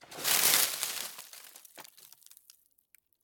rummaging through compact wood dry branches debris rubble; eneas mentzel; sonniss gdc